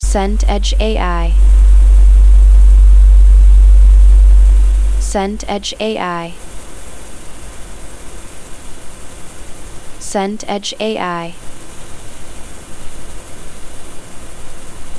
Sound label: room tone bedroom